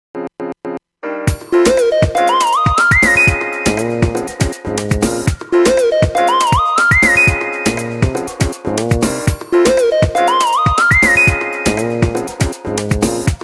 Nokia 7380 Ringtones

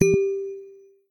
Bottle, notifications; Motorola; Edge 70 Max China

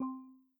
Charging Started; Lenovo; ZUI 13

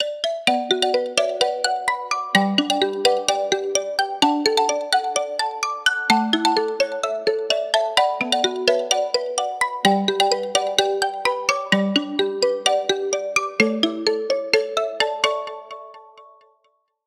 Marimba; Honor X7C

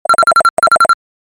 NOKIA GENERIC Urgent

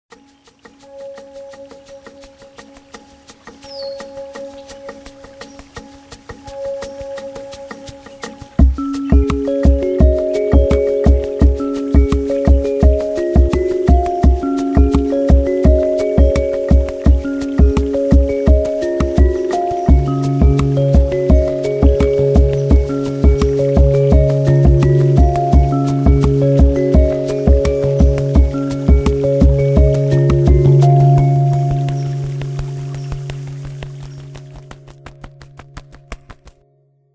Leaves on Water; Nokia; Nokia Tones; Windows Phone apps

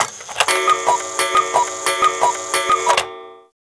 Cuckoo; Nokia; 1110b

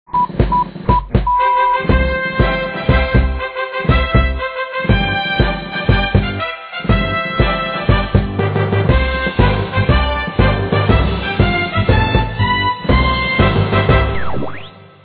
Newsflash; Nokia; N70; Muvee

